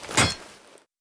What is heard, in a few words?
hit, knife; weapons; cw20 knife g4p